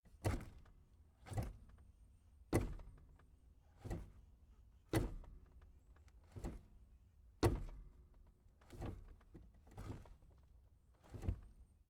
car pedal

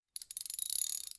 ratchet wrench slow

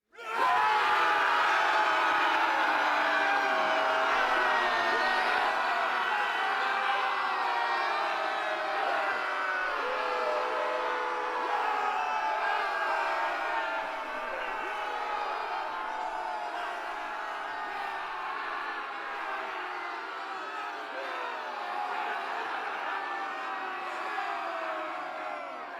battle cry high pitch, crowds

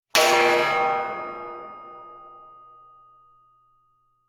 crash steel pipe

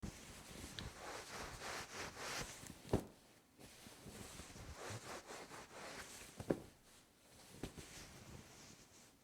dry off with towel

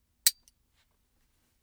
gun trigger release

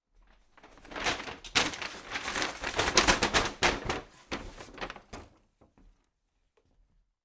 paper bag opening

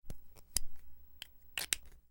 put cap on pen

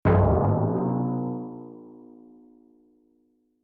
stomach thumps

